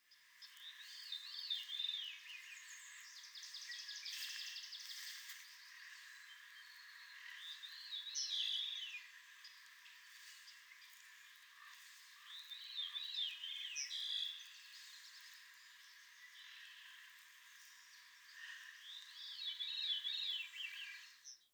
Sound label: Veery Songs